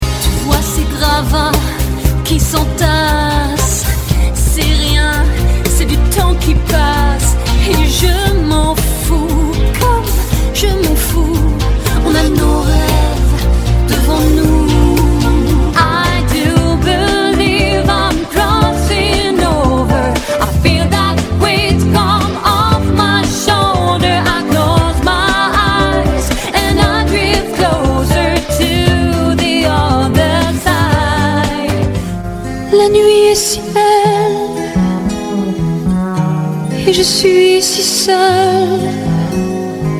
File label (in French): Medley chant